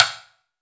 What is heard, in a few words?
timbales; AKAI S-Series CD-ROM Sound Library VOL-5